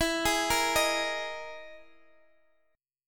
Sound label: E Major 7th Flat 5th, Guitar, Open G